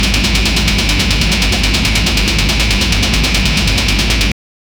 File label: spinning whoosh heavy; movement